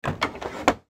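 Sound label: photocopier sounds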